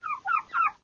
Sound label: Gosling Idle